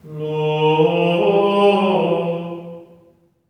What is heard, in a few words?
GR ALLELU, Symphony of Voices; Spectrasonics